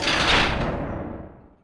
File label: door blast open stop